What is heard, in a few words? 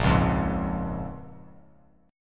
A sharp Minor 7th, Bass Standard; strummed